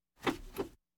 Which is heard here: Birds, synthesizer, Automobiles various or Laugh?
Automobiles various